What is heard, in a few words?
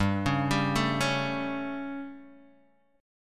G Suspended 2nd Flat 5th G down Guitar Half Step Down, chord